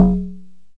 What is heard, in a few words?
TomToms C2, Yamaha DX100; DRUM MACHINES